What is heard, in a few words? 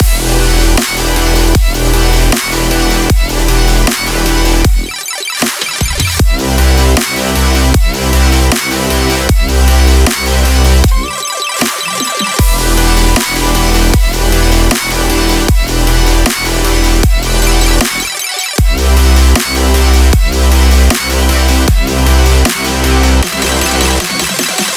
epic emotional melodic dubstep loop